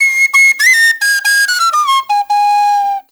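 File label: PENNY WHISTLE; Spectrasonics; Heart of Africa 2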